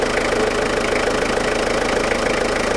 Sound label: diesel loop, Vehicles Engine; civ13; civ14